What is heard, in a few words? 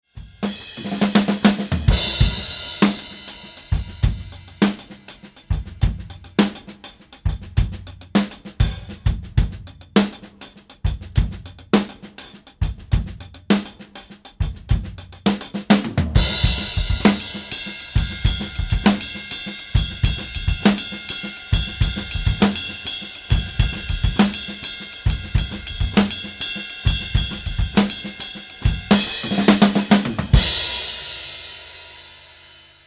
Afro Cuban Fusion Groove